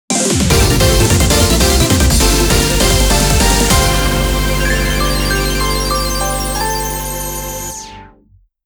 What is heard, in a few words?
Defeat; Azure Striker Gunvolt Complete CD Collection; Gunvolt 3 Ver.